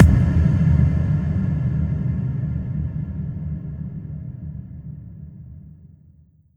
Hit, звуковой эффект в стиле техно транс

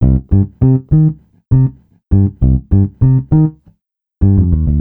Old Bass, funk; бас

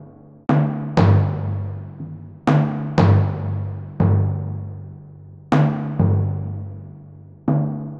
timpani

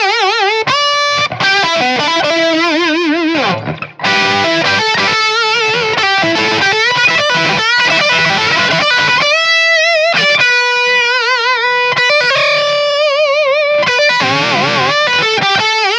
Solo Guitar D, соло

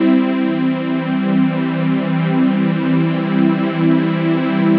Charisma Pad; пэды

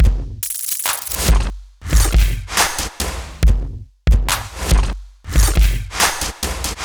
Nation Drums, trap

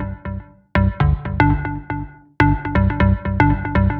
House Organ; орган

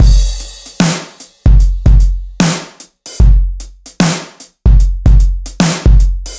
Driven Kit

